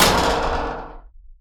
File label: bounce lg, ladder